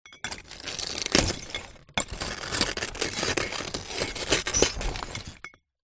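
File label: broken glass bottle pieces crush grind; zapsplat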